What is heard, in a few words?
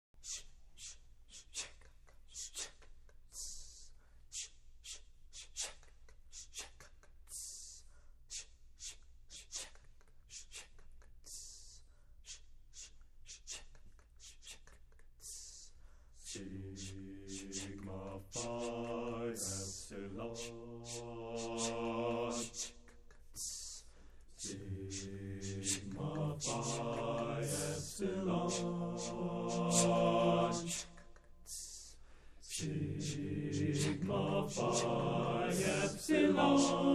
Dorian Chant